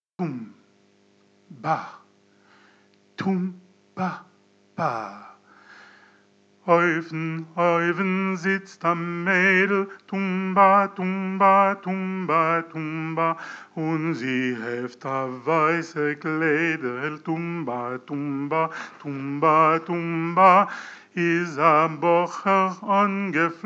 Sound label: tumba chant